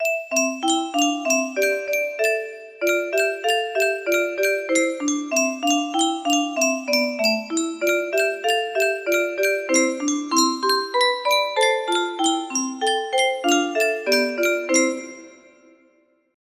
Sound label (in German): yunsheng spieluhr